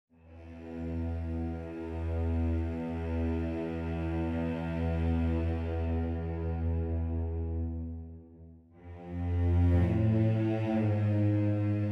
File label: cellos